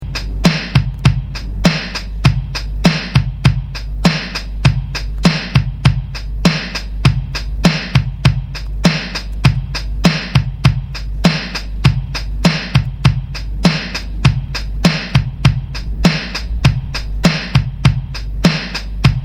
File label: drums; e-gitarre